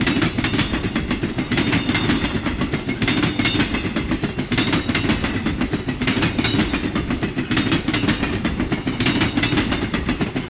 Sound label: Train loop